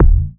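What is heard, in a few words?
bass drum, Yamaha TX-81Z; DRUM MACHINES; Yamaha TX-81Z Extreme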